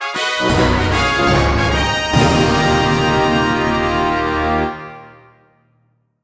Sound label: fanfare, mono; Kirby Planet Robobot; 3DS